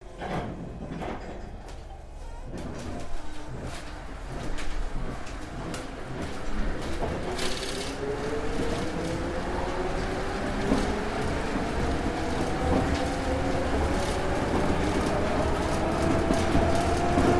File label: monorail start